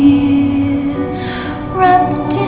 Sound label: female laugh